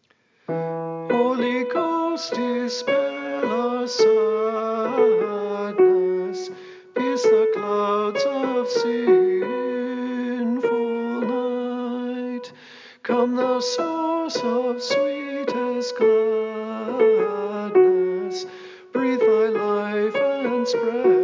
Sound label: Soprano; Bach; Holy Ghost Dispel